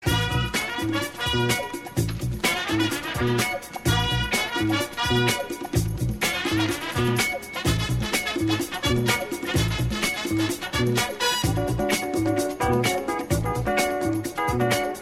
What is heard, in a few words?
cool jazz